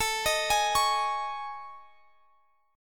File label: A Major 7th Flat 5th, Guitar, Half Step Down